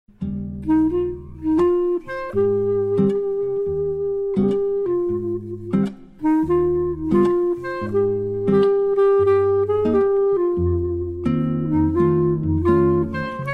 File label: clarinet